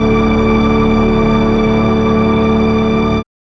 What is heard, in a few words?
STRCHD; E-Lab; X Static Goldmine 1